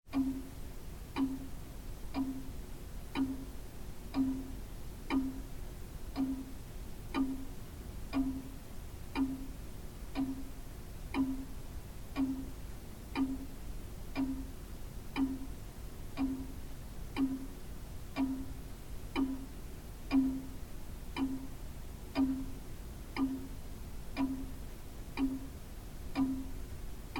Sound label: mysterious bouncing clock mechanism a longcase clock at the clockmaker s museum